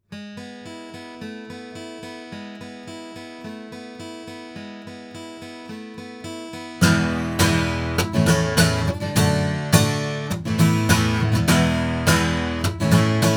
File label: guitar; CT Studio Vocalist; microphones; Crowley Tripp